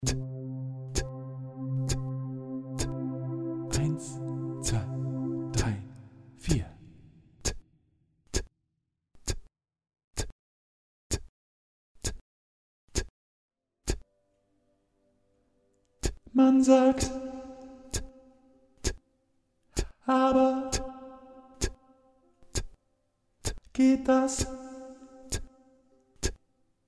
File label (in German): Jede Stimme Tenor Solo